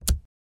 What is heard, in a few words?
popup open sound; whatsapp-pro